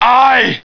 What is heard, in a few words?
breathe; svencoop; cracklife; garg